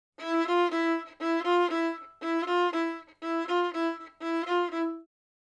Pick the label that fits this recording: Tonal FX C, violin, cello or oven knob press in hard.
violin